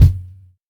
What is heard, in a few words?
Industry kicks